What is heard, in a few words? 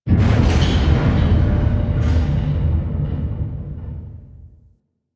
Powerplant Jettison Ronk; Ambience; Warframe; Corpus Railjack; Tether Power Plant